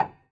Prepared Piano

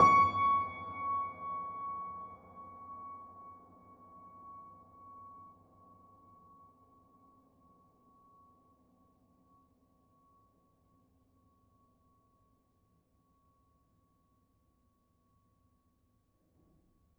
Note Ped, Piano; Piano Miscellaneous

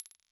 Bottle Struck

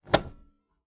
Typewriter

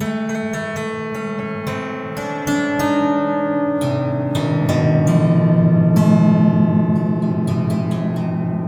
Detune, Piano; Piano Destruction

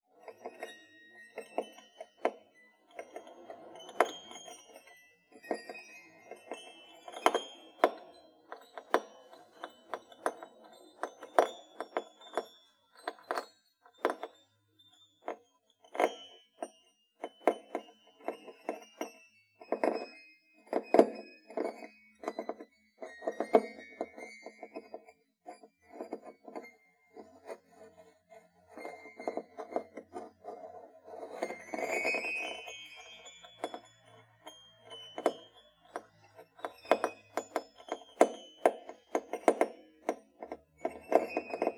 Knock Rub Erratic, Piano; Piano Miscellaneous

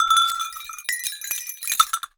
Cowbell Chain, Percussion

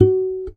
Classical Guitar Extended Technique

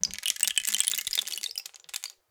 Rain Stick, Percussion Samples

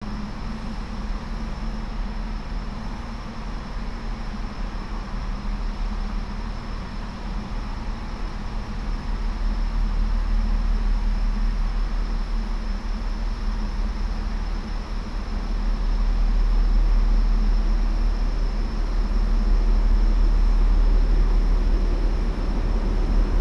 Station; Liverpool; Sound Scapes

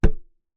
Key Noise, Melodica

